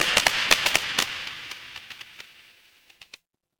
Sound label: fire electric spark